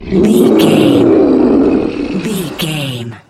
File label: troll monster battle groan